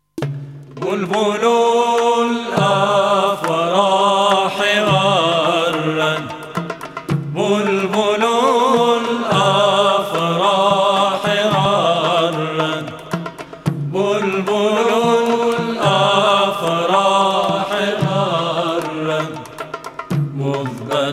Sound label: bolbol afrah; anachide